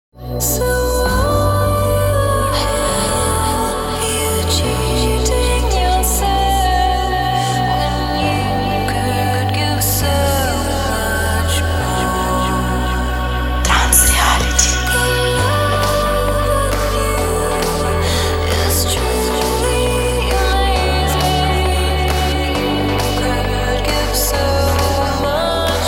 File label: ringtone